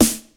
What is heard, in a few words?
old school good pop acoustic snare a sharp key